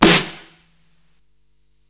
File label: Snare, Psychedelic Drums